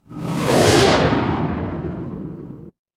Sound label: magic scifi rocket